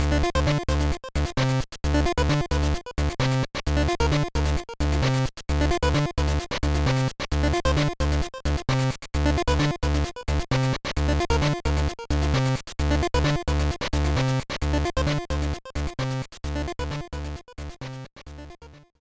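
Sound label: background music; No No No Puzzle Chailien; GBA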